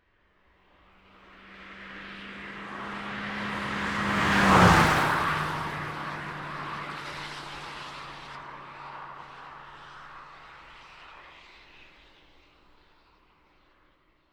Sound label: BMW 114i E87 Exterior Passby High Speed Mono; SoundHolder; Cars In Motion